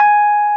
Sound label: G#4, RHODES EP2; Sonic Reality; Vintage Timetraveler